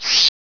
cricket angry; gearbox

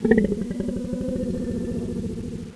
idle, voltigore; svencoop

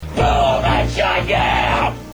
boom; dod

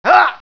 bandit flinch; gearbox

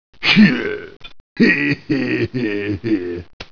victory dance; gearbox; demoman

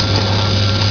door move; gearbox

